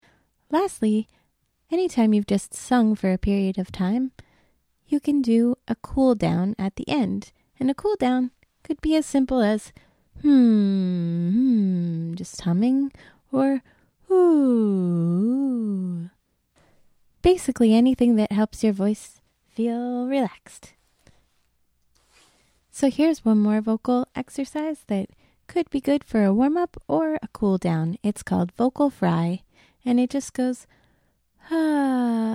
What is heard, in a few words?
Cool Down vocal fry